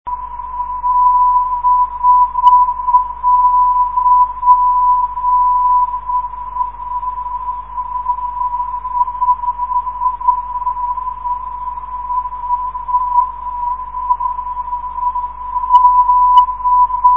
985kHz, ndb

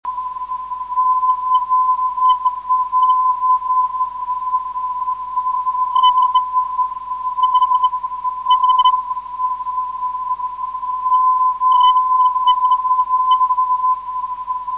348khz, ndb

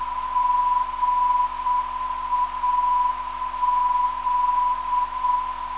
380 kHz, NDB; GAZ

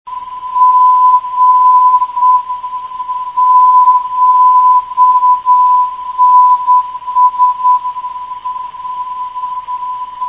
452 kHz, ndb

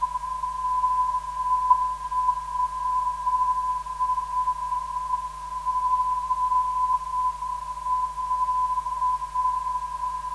398kHz, NDB

